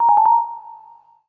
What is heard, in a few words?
sync fail, Samsung SGH-i320 System Sounds